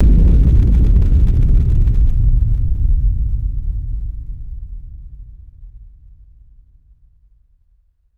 massive cinematic low end boom; designed

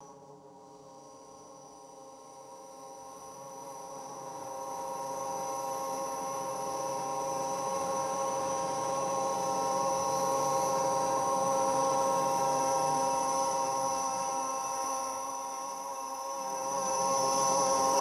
low resonant metallic drone swell

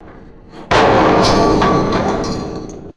heavy metal sheet falling and rattling

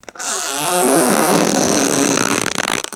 squeaky rubber balloon inflating

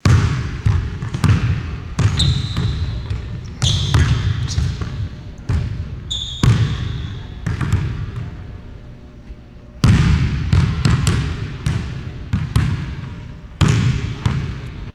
distant indoor basketball dribbling; sports

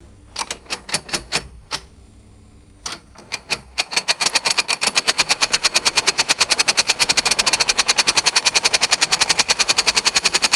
heavy mechanical winch ratcheting quickly

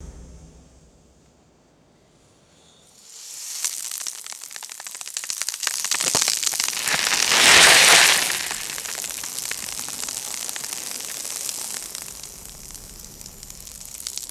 rope falling and scattering rocky debris

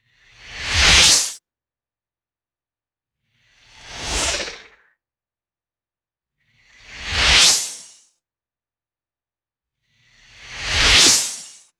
fast hissing synthesized rising whooshes; swooshes

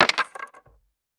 small wooden pieces dropping and clattering

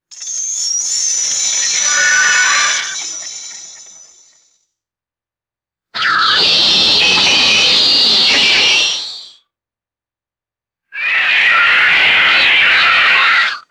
robotic alien shriek and airy hiss; creatures

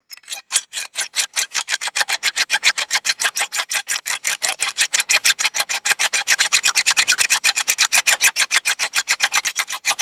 resonant metallic saw blade wobbling

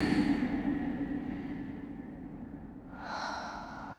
mystical airy breath exhale whoosh; designed

